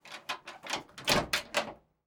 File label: Door open